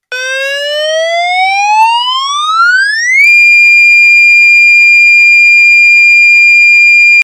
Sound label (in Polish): ton